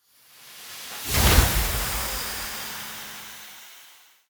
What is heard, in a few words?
vapour boom